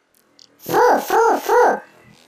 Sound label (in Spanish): gato fufufu